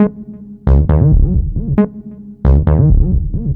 Synth, Techno

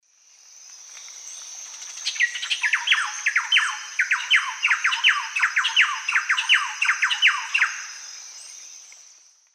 Campylorhynchus turdinus, duet; S. America; Eastern Lowlands

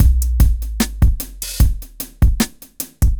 hiphop

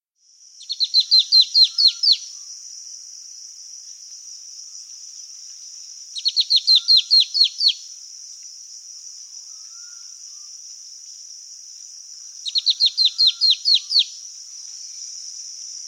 Black-Throated Antbird; S. America

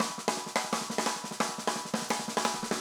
jungle 170 fill

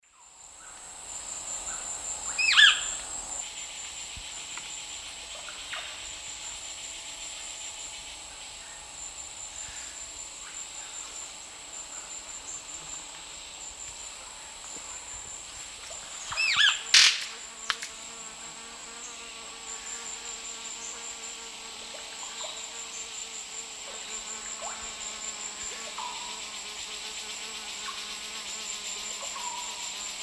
Manacus manacus, lek call and wing snaps male; S. America; Eastern Lowlands

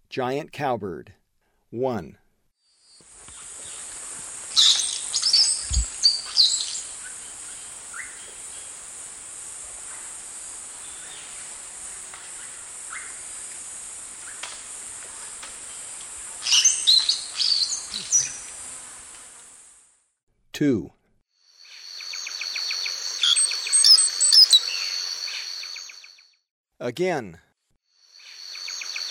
Giant Cowbird; S. America; Eastern Lowlands

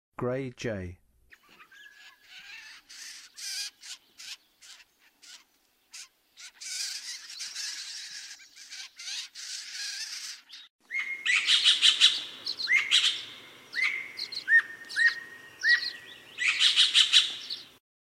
Gray Jay, Songs of Birds of North America; Western USA; Western USA Songbirds

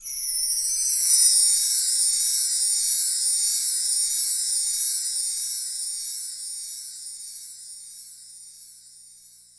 R&B

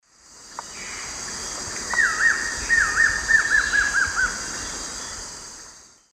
Xiphorhynchus guttatus, call; S. America; Eastern Lowlands

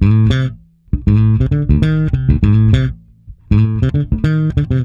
Bass A Funk

